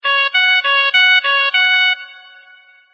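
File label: medic deploy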